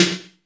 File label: WET S808, WET SNARES; Masterbits - Soniq Elements